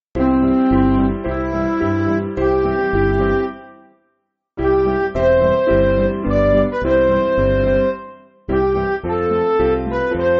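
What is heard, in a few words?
Midi, Music Backing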